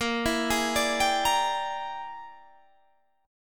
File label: E 7th Sharp 9th Flat 5th A sharp, chord